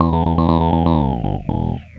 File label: Electric Bass; Basses